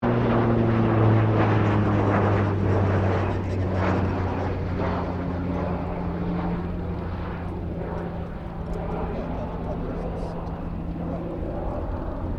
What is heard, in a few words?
2007 KING AIR 350 PASS; Airplanes; Vehicles